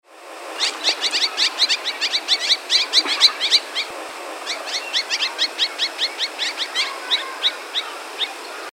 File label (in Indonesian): suara kekep babi panjang